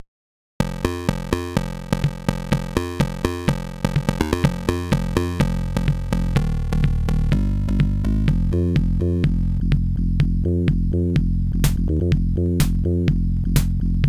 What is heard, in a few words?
sms plus disco psg opll; weeklybeats 2024